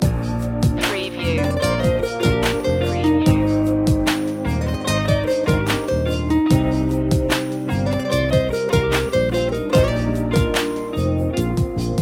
sand loop

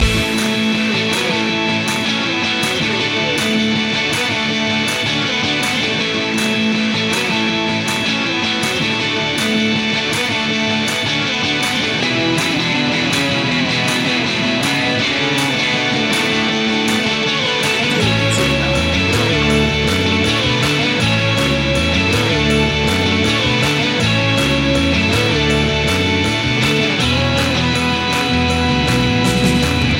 maximum rock n roll loop

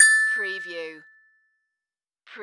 Multimedia Bell

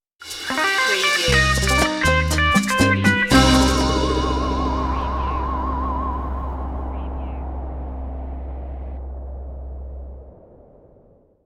bolero stinger

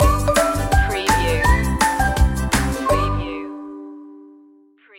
learning for life stinger

